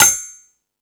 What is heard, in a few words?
Rap Session, E-MU Pro-Cussion